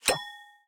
cash stack reel bounce; vegas night life

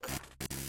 win display; spinsane

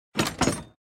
medium Chest Closes; lost relics

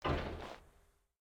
reel Bounce; rage of the seas

